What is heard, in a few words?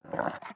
wolf breathe run